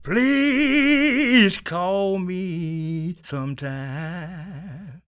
SING, Blues Soul; Spectrasonics Vocal Planet

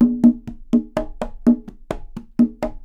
Percussionworks

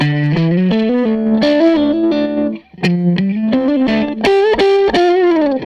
Strat Guitar Loop

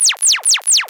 Zapps, sci-fi

